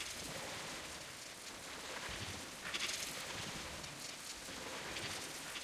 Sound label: Glitch Furniture; MiSide